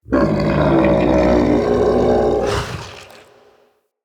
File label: Camel Idle Vocal; Zenith Camel Vendor; Vocalizations